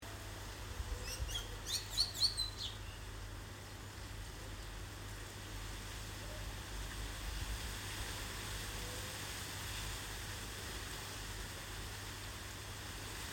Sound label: carib grackle